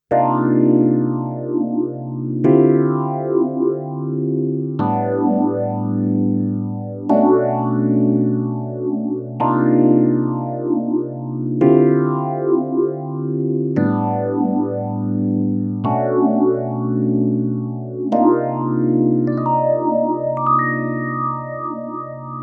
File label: mutron Ph